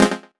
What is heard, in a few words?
Synth Cuts; Vengeance Samples; VES1 Instruments